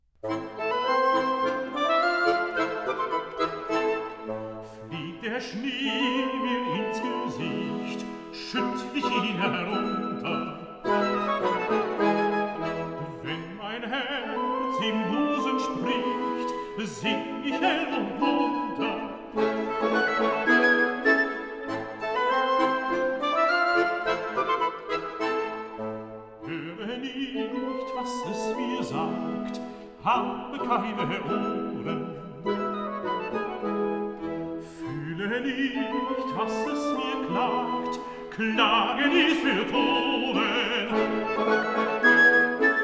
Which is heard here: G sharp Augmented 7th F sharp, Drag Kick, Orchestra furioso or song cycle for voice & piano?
song cycle for voice & piano